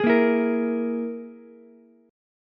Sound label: Gsharp Suspended 2nd Sharp 5th, Ukulele; strummed; 13,10,12,13